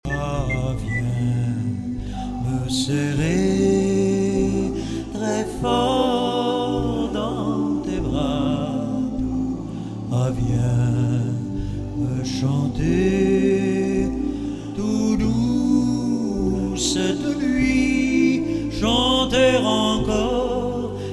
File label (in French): Berceuse; Violin